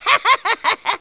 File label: CLOWN LAUGH, clown laugh